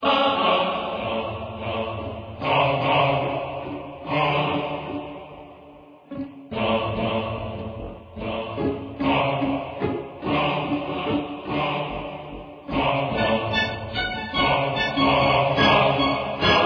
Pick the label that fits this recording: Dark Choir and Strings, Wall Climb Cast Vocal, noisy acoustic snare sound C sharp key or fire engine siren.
Dark Choir and Strings